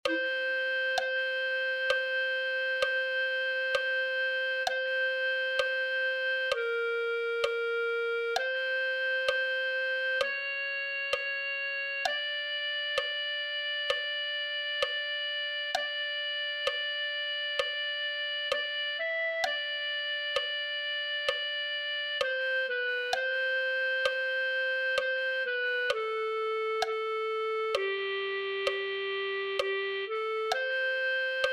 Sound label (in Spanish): clarinete1